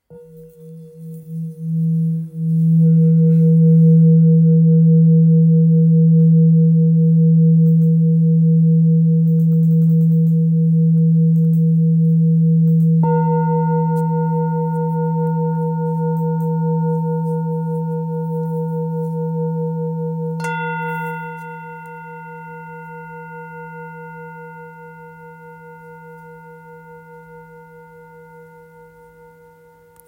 Bol real full moon mi

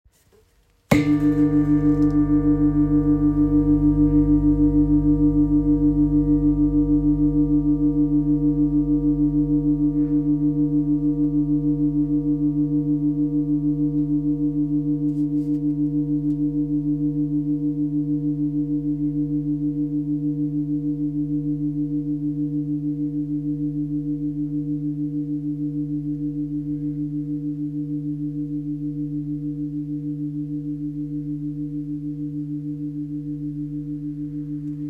Gros bol sol

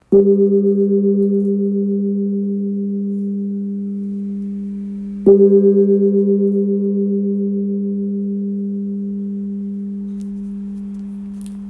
Gong birman Sol 1.2kg

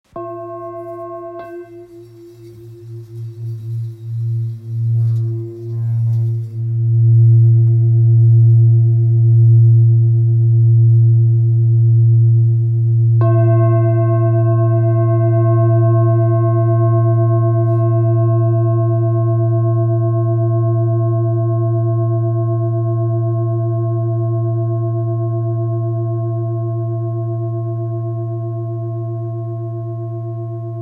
Bol bengale fa

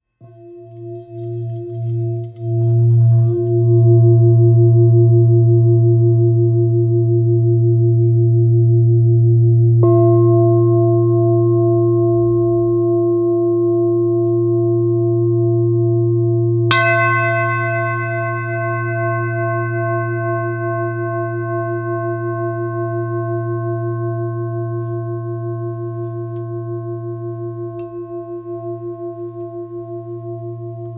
Bol bengale fa

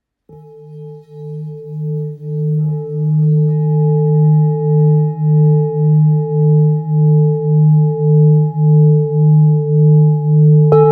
Bengale 1.4-1.5kg la

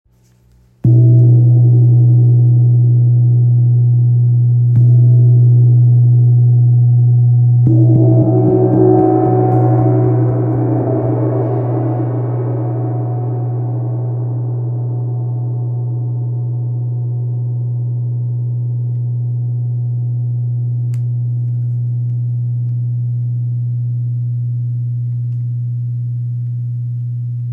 Wind gong 60cm si

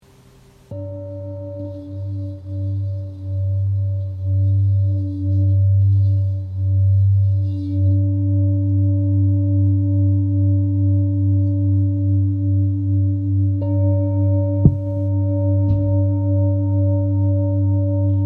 Bol bengale 2.1-2.2 re

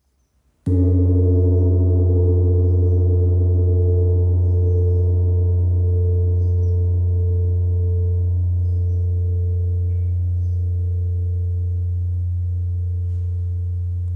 Chau gong 60cm fa

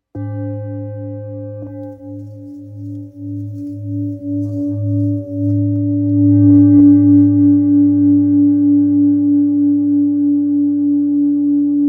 Bol ramgandi sol diese

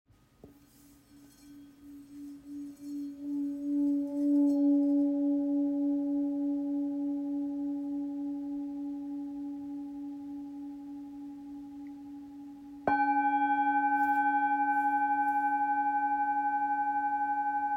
Bol real FM do diese; Capricorne 830g

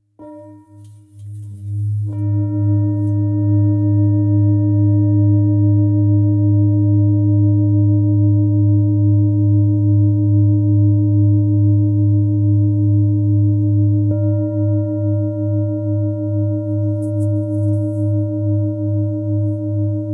Bol bengale sol diese

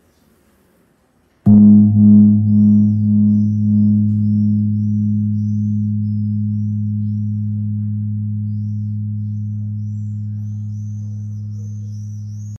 Gong birman 8.6kg 54cm note Sol dièse

